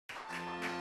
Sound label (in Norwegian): vignett; Brødrene Dal